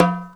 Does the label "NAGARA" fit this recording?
Yes